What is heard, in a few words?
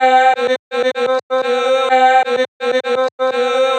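chopped vocals female C minor 127; sound effects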